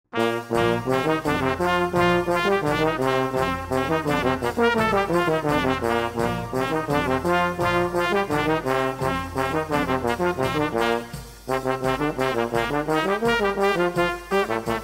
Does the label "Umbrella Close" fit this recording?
No